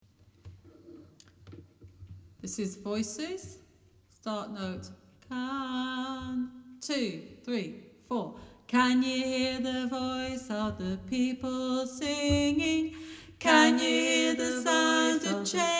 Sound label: Voices mixed 4 parts